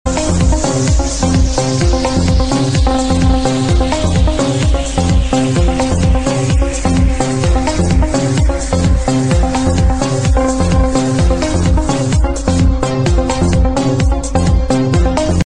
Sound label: arabic latest ringtone